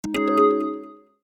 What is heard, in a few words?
reminder